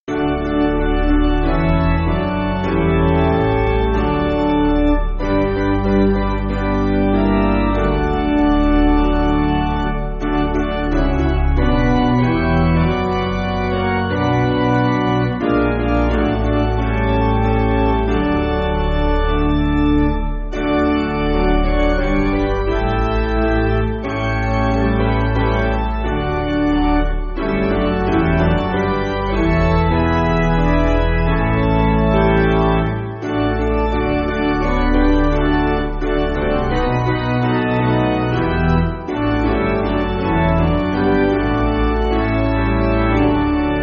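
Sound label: Piano, church backing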